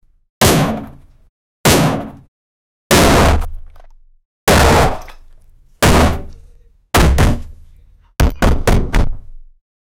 various loud thwacks bang

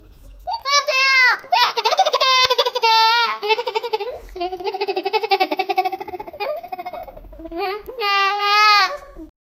small kids giggle

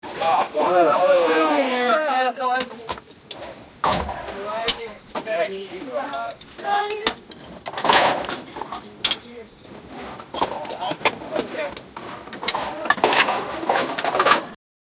people are digging

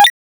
8bit high pitched interfa

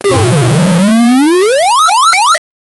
success fanfare reggae

